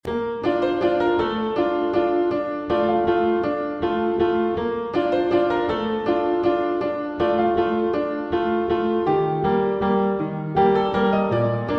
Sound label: piano